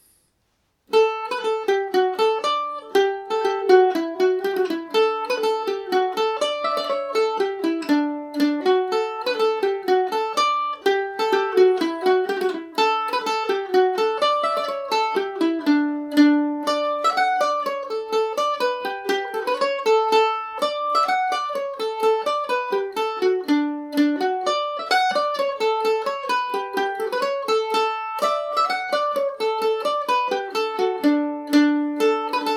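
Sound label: D major, irish mandolin; kerry cow revisited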